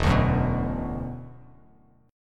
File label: E 7th Suspended 2nd, down, Bass Standard; E7sus2; strummed